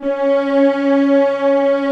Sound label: Orch Hit, C#3; Sprints